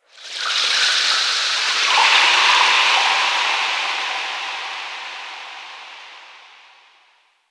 Ocean Underwater Texture Small; Ambience; Warframe; Grineer Ocean

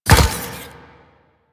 Moa Step; Warframe; Quests; The New War; Veso

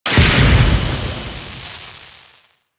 Railjack Exterior Ship Wreck Sparks; Warframe

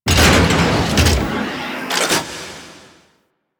Exterior Radiator Activate; Props; Warframe; Railjack

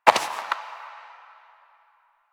Step Dirt; Warframe; Koumei

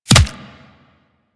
Player Bullet Impact Flesh; Warframe; Weapons

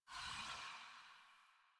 Generic Footstep; Warframe; Choir; Foley

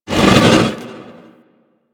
Rock Elemental Attack Vocal; Warframe; Brawler

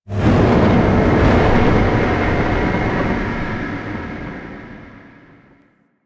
Mood Change Stinger; Duviri

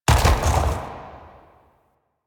Unyielding Gallop Hooves Land; Warframe; Dagath

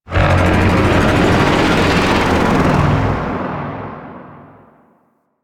Void Dragon Vocal Idle; Enemies; Vocals; Warframe; Duviri

